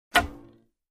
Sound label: Bow, arrow hits the target; cold weapons